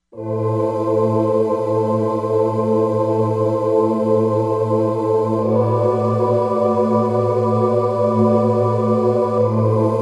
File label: Soft Voices, Korg MSC-07S Synth